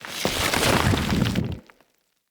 Root Spider Burrow, Foley; Animals

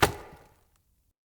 Acorn Impact; Cinematics; Bromius; Bromius Tree Rub; Bromius Quest

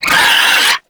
Swarm Vocal, Enemies; Warframe; ManInTheWall; LimbVocals

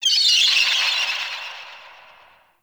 Corrupted Beach Seagull Day; Ambience

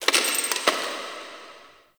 Zariman Metal Debris; Warframe; The New War

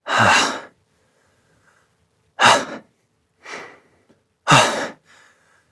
Breath Heavy Slow, Adult; New War; Adult Operator

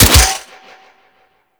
Bow Shot; Warframe; Weapons; Tenno; Daikyu Bow

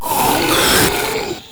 Proto Infested Ancient Vocal Pain; Warframe

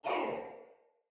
Pain Soldier Male; Battle; Barks; Dialog; Sinecure Soldier VIP Male A

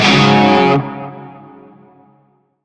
Shawzin Yo Chord G; Warframe; Narmer

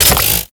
Ink Rifle Mech, Weapons; Warframe; Tenno

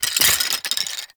Bone Effigy Destroy; Gameplay; Warframe; Tau; Destructible Dynamic

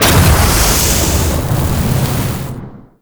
Void Dragon Pillar Ground Fire Start; Enemies; Abilities; Warframe; Duviri

